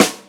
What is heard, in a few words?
Snare, Yamaha RY-30 SNARES; Drum Samples